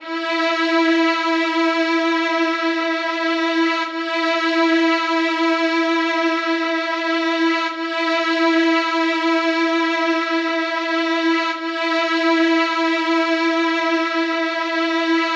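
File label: Strings 125 C minor Dry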